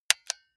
console controller xbox rt press fast

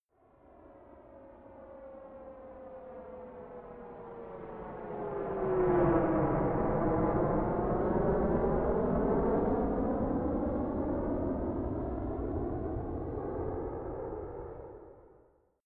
military jet fulcrum flyby fast distant

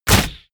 crossbow shot

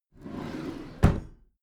packed drawer smooth close regular

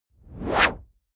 whoosh noise sweep hit long

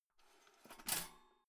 toaster soft eject

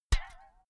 filled can hit bounce ringing

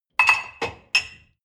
dishwasher cup grab

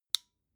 flashlight push in

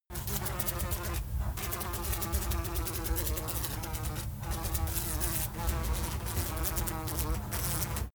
fly window

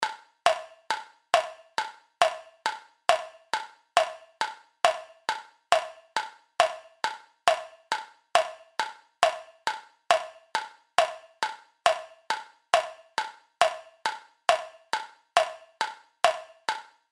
game show clock

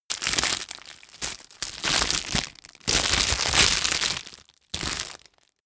plastic packaging

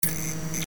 energy and rays

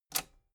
metal button robust spring push disable

